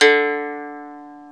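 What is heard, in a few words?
ethnic plucks; Eth Plucks, Pluck Duo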